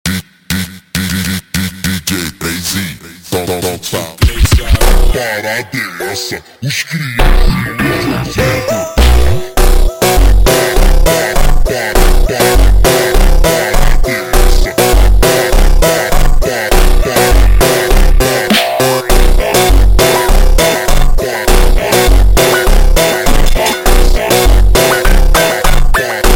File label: Montagem super Slowed